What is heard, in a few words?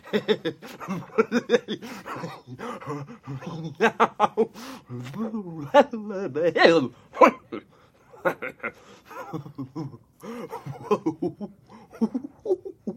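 crazy person; sumasshed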